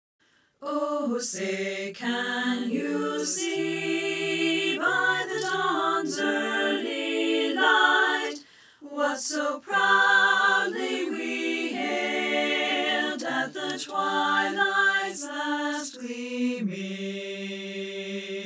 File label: satb